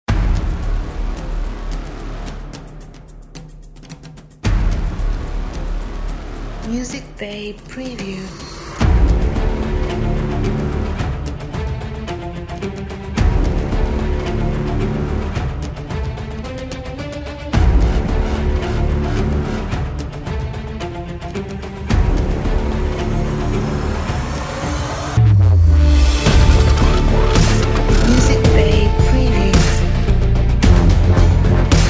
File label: Intense Epic Dubstep Trailer